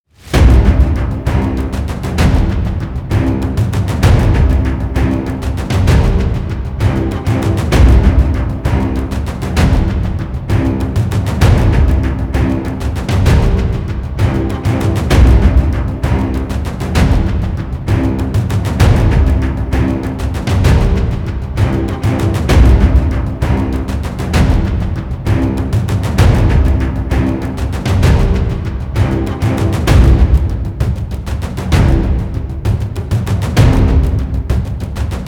voltage violincomposition; by burak sound bank; tension gerilim vol 4

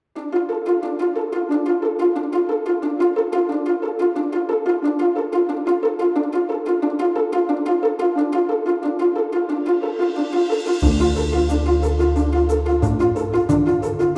cheerful entertainment pizz; by burak sound bank; cheerful vol 4

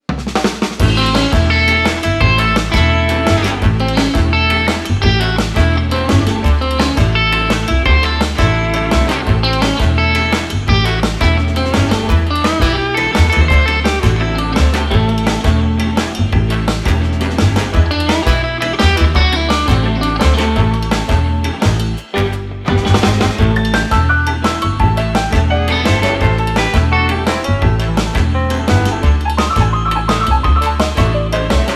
cheerful entertainment dual solo; by burak sound bank; cheerful vol 8

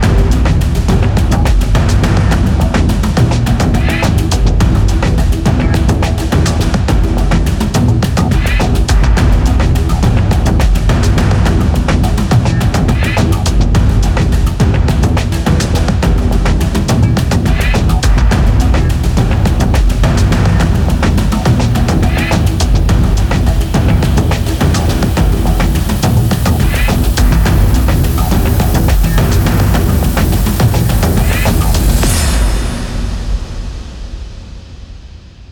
tension violin; gerilim; trablus vers; By Burak Sound Bank; tension vol 19

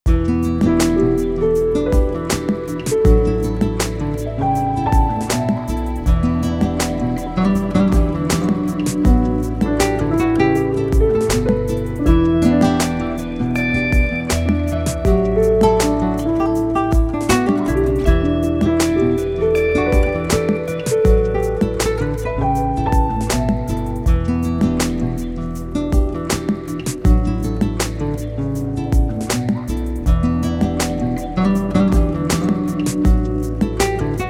dramatic drums ups loop; by burak sound bank; dramatic dram vol 11

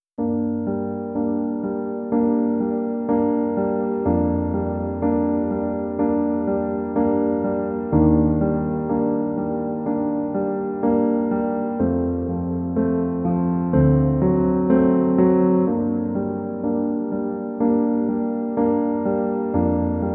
dramatic no violin; By Burak Sound Bank

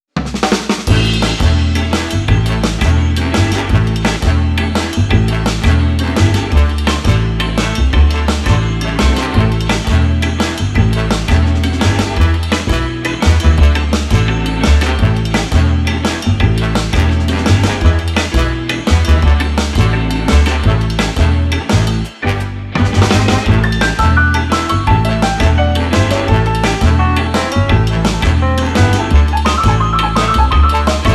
cheerful entertainment no guitar dual; by burak sound bank; cheerful vol 8

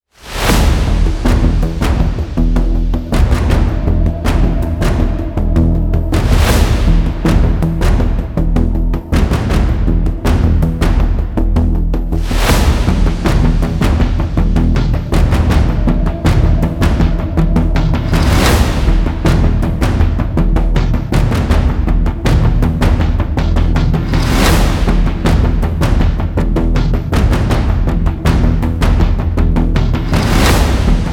tension; By Burak Sound Bank; tension gerilim vol 20

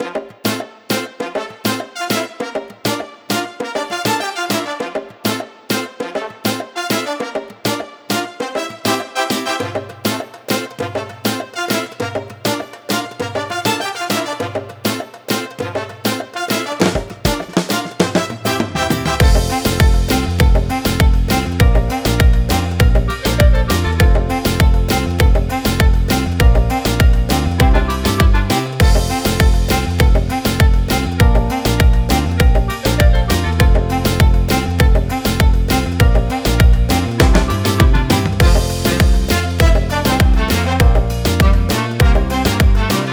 cheerful; by burak sound bank; cheerful vol 23

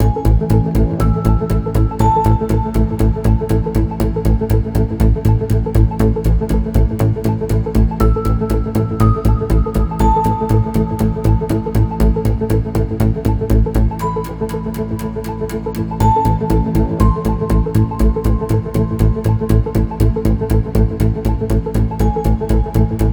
tension project; By Burak Sound Bank; tension gerilim vol 16

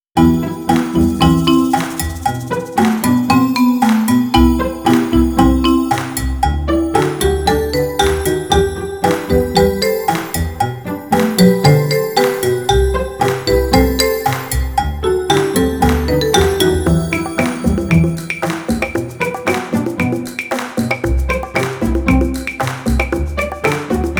cheerful entertainment pizz; by burak sound bank; cheerful vol 15

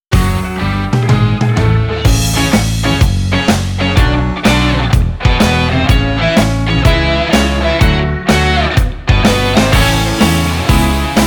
sweetness guitar; by burak sound bank; cheerful vol 4

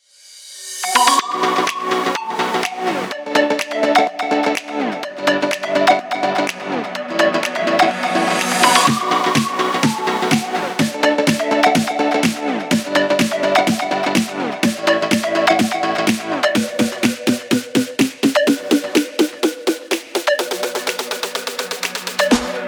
house electronic techno; By Burak Sound Bank; house electronic techno vol 6

